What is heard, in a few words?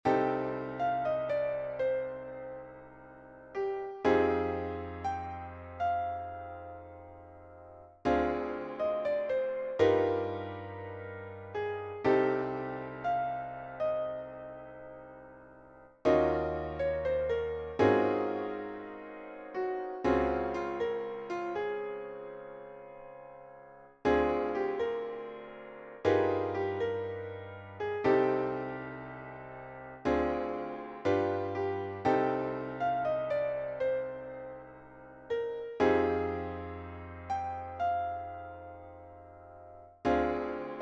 slow